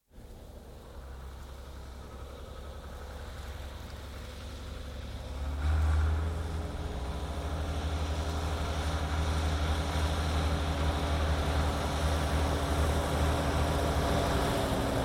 Two Ford Tractors & trailers, exterior, approach, pass & depart; Tractor Sound Effects; BBC